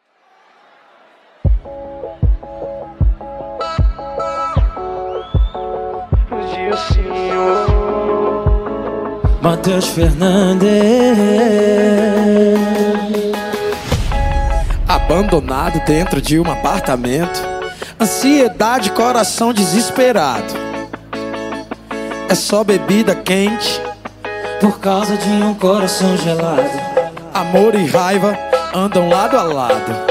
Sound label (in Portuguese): sertanejo